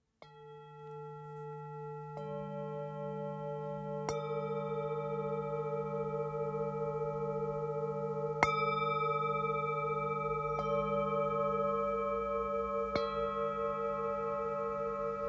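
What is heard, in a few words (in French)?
Set ceremonie, bol chantant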